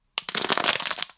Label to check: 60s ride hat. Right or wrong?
Wrong